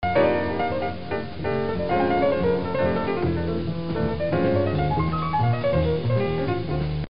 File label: piano; Acoustic piano